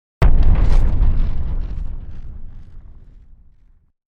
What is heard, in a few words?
Impact; Sound Design Elements